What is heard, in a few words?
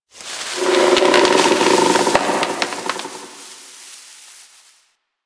Exterior Tree Wood Creaks Angry; Warframe; Duviri